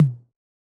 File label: Tom, Yamaha DJX 2B